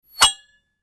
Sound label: metal whoosh hit